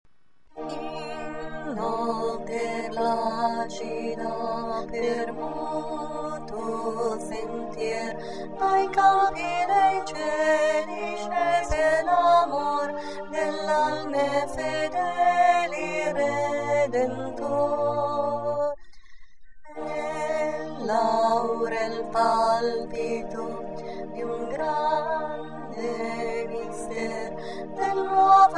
In notte placida - Contralti+Base; Rorate Coeli

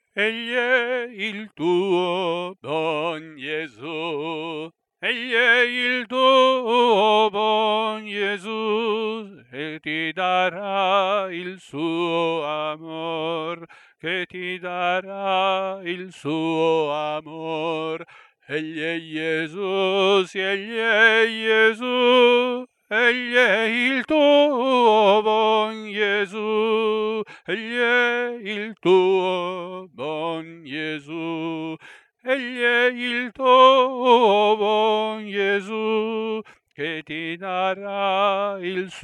Soprani; Egl e il tuo bon Jesu; Passio

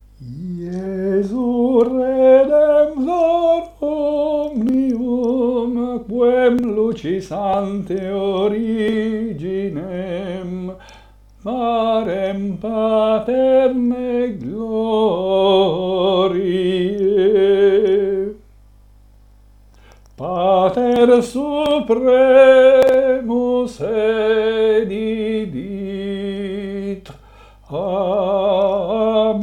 Jesu Redemptor omnium - Soprani

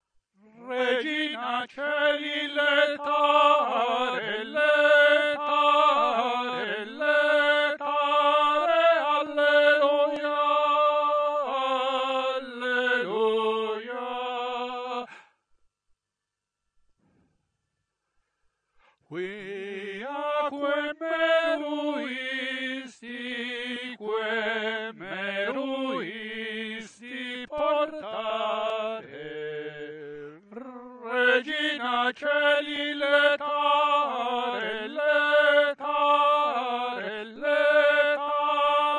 Regina Caeli - Tenori; Aichinger

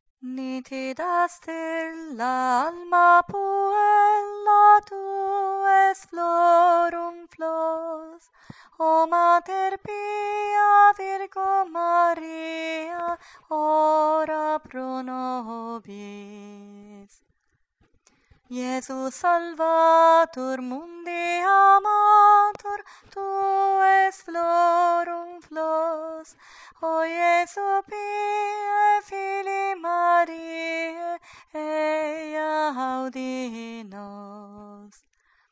Nitida stella - Contralti

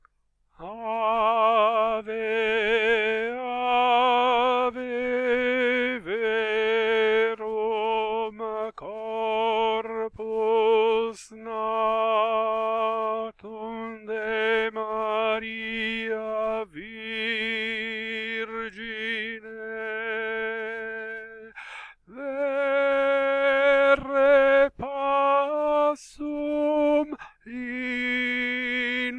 Ave verum - tenori; Natum de Maria Virgine

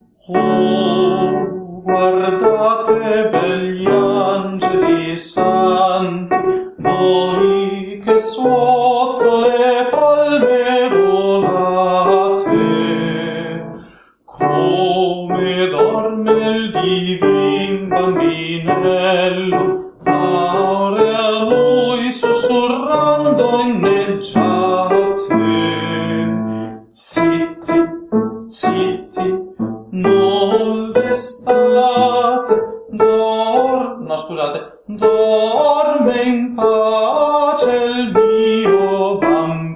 Canto di Maria - Soprani; Natum de Maria Virgine

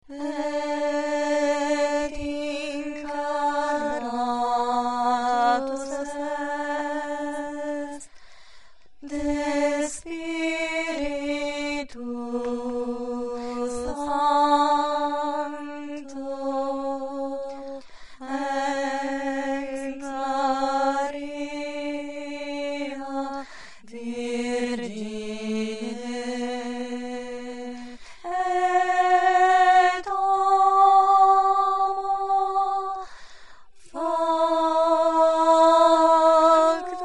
Et incarnatus est - Contralti; Natum de Maria Virgine

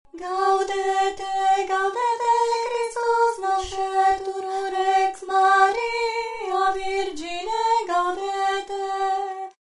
Gaudete - Contralti; Gloria in excelsis

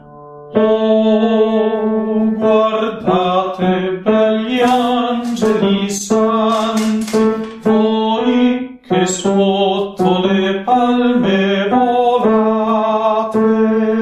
Canto di Maria - Tenori; Natum de Maria Virgine

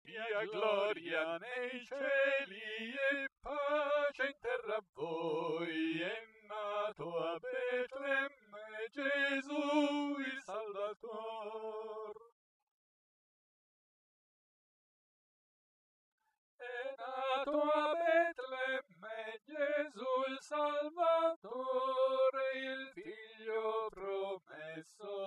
Sia gloria nei cieli - Tenori; Veni Emmanuel

